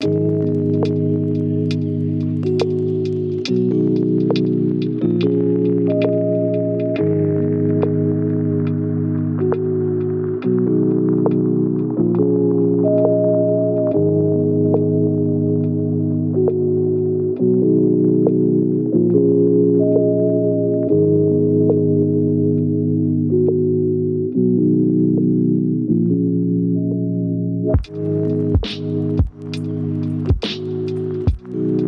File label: chillhop lofi studying instrumental